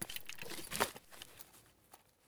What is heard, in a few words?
pm holster; Escape from Tarkov